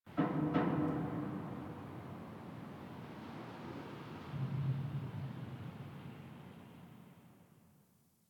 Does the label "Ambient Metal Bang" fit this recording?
Yes